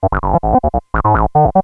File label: square